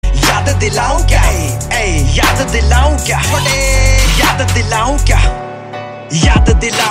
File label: Funny Notification